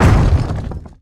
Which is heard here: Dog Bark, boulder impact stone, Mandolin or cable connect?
boulder impact stone